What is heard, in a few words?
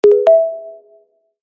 Ringtone Notification Cute